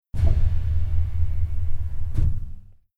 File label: Keyboard Noise